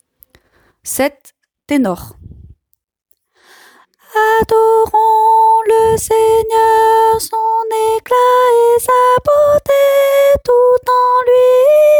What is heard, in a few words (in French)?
ténor